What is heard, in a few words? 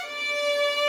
violin